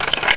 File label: scar change, weapons